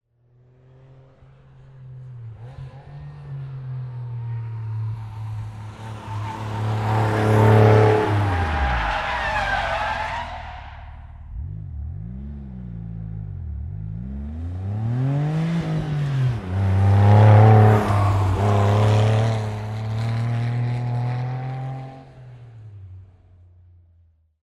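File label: Porsche Turbo rushes by, turns around and comes back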